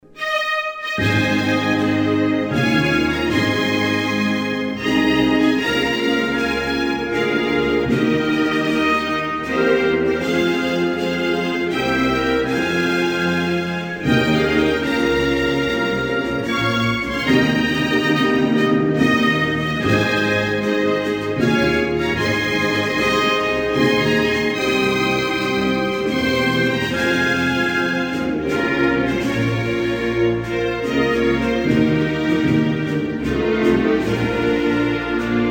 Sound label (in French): Kazakstan, Hymnes nationaux; Kazakhstan